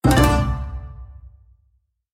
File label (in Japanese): wa dodon shami; 和太鼓と三味線の「デデン！」という効果音; 和楽器・和風の効果音